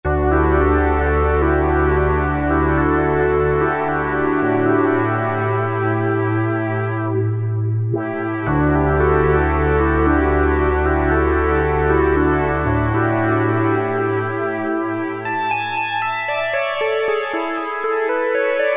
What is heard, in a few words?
Strings; Cheetah MS6